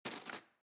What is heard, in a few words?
cutlery teaspoon drawer grab hard